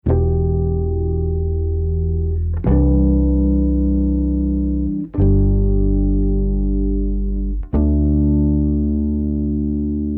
harmonics

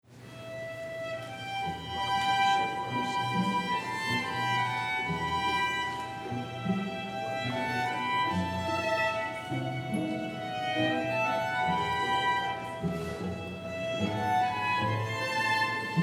string intro

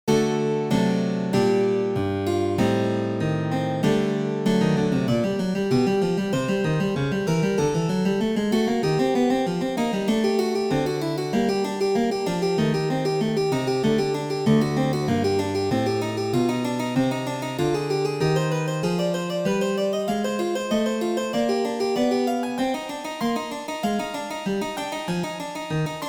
harpsichord; invention 3

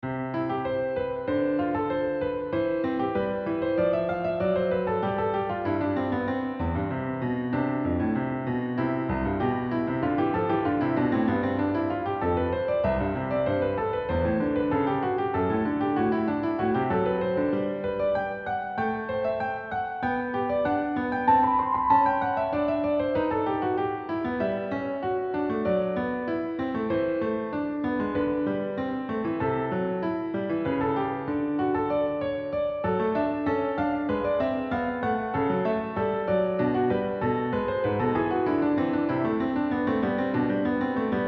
invention piano

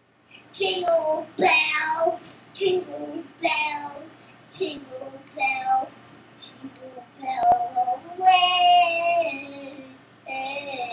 jingle bells

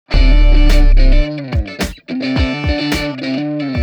karaoke

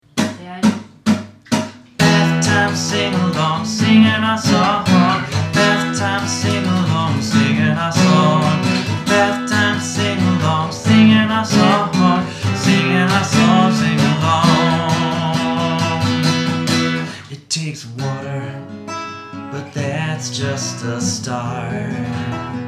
bathtime singalong

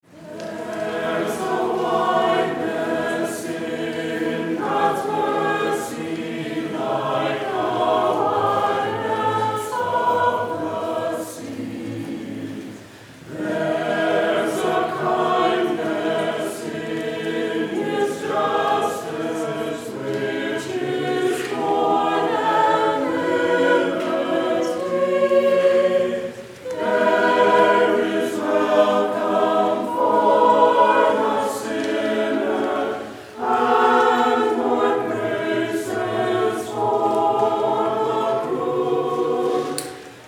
choir

